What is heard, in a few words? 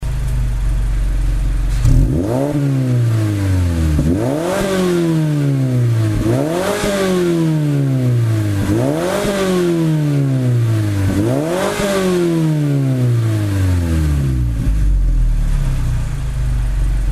Mazda6 Atenza GJ Muffler Audio; AutoExe